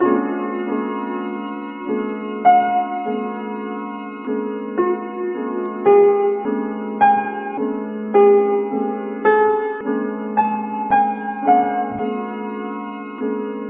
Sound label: piano, sad loop